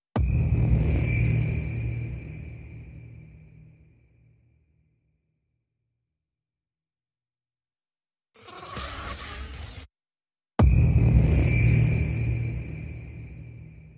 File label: Percussion Only